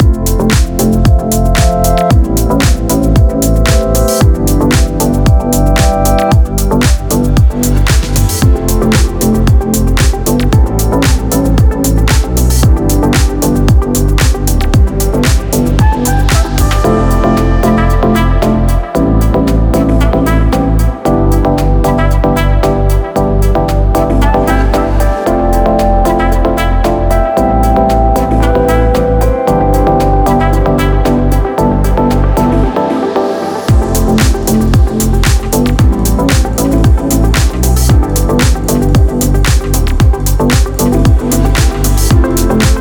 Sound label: Fashion Lounge Loop; fashion house lounge